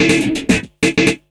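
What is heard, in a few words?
fx drum, House FX oneshots; E Lab; XTc Files of House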